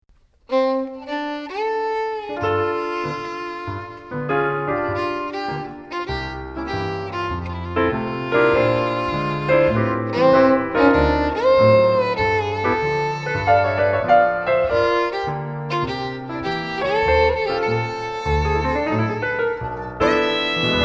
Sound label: Violin